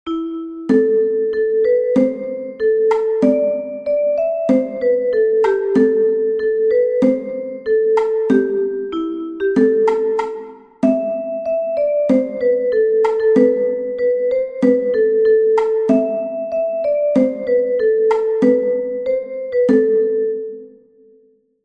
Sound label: Cajon; The river is flowing